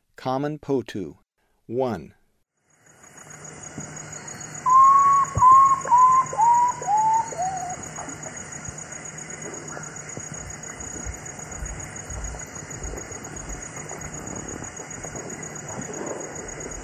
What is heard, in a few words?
Common Potoo; S. America; Eastern Lowlands